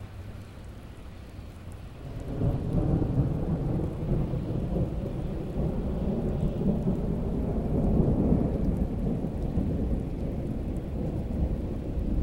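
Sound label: pleasant sounds of rain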